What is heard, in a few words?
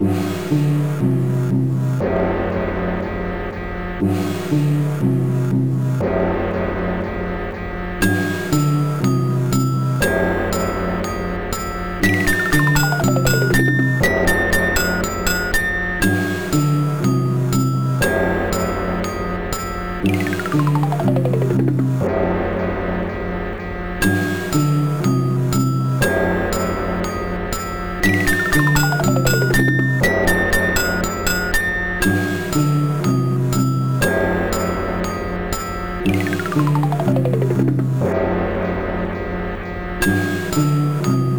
drone